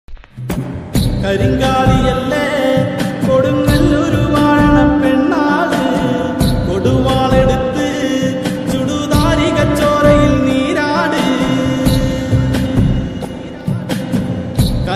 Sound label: ringtone